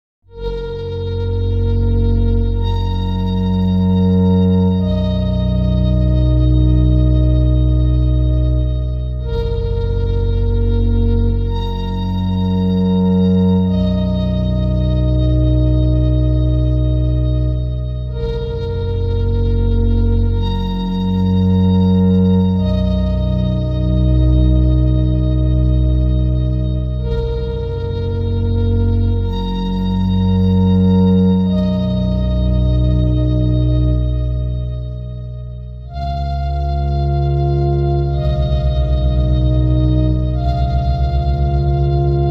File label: synth